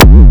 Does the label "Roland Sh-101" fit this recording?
Yes